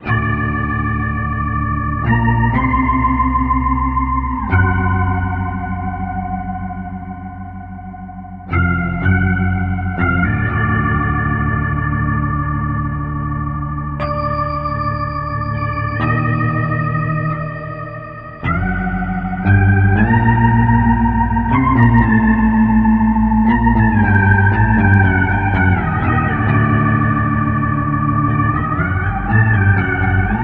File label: Boss SY 1 Organ